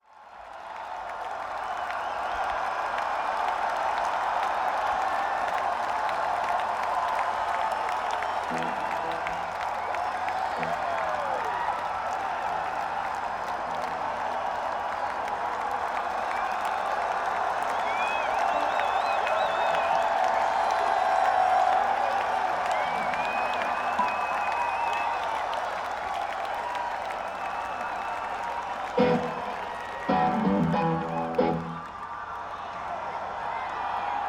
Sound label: Tuning; Limestone, ME; Loring Air Force Base; IT Festival